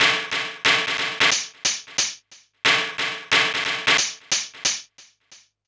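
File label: SCUM hi-hat; Big Fish Audio; Uncivilised G'rooves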